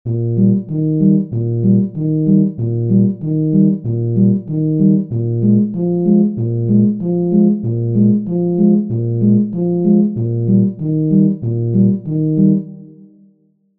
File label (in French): Pa Poum; Basses et Barytons; Style "fanfare des tropiques", "Bergler polka", etc.; exemples alternance temps forts/ temps faibles